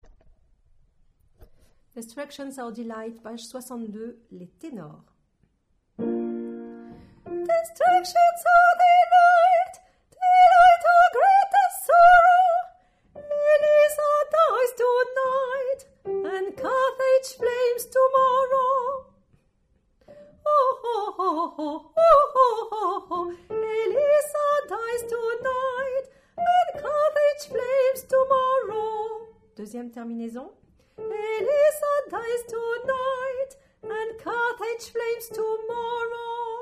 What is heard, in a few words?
Tenor